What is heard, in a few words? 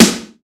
noisy snare g sharp key, Loudest frequency: 2377Hz; snare drum samples; one shots